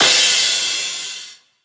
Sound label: cymbal orchy; Drum One Shots; SD MIDNIGHT Sample Pack; Slate; Cymbals